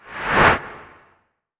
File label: fast air woosh